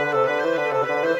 Bassoon Clarinet, Orchestral; Sonic Foundry (Sony Creative Software); Orchestral VOL-2

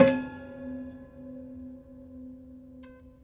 Prepared Piano; Battery 3 Sample Kits

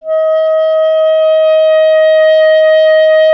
clarinet D#, Solo Instruments; Miroslav Vitous - Solo Instruments VOL-1; 90 s Sample CDs

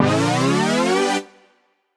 up G, Poly Chord Hits Ramp Up; JPEGG; future rave

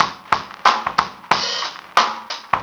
breaks 91 bpm; Dickie Bevins; Zero-G Beneath the Planet of the Breaks

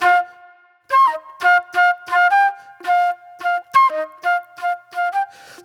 Wild Flute

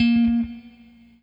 ROCK, Guitar

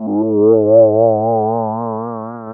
DR. LOOP, BUZZ; X-Static Goldmine 3

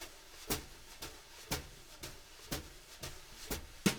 BRUSH LOOPS, Living Drums; Sampleheads; Peter Erskine

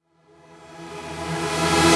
Reverse Synths Emaj; Effects; Vengeance; Essential Clubsounds Vol.4

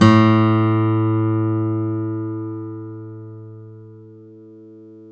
STEEL P A1, Synclavier, GUITARS; Synclavier Sampler Library

